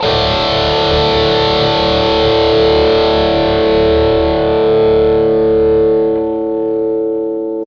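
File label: GUI MET2 D3, metal guitar; Sound & Vision; Gigapack I CD 2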